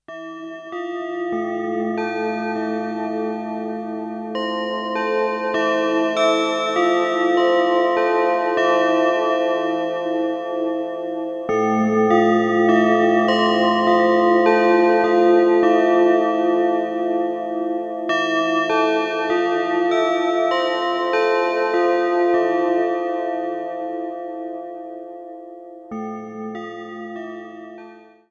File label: Bell Improvisation